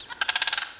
crane; anubis

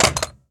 weapon shotgun impact; physics

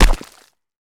land mud, footsteps; player

